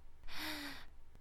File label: Breath; Lewd SFX